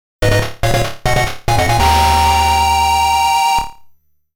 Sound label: Stage Clear